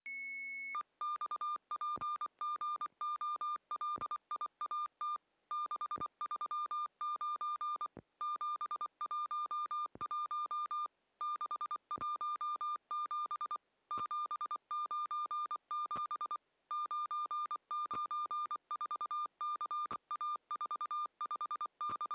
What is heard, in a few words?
Morse 8k; Goliat; gr-satnogs